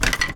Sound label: woodpile drop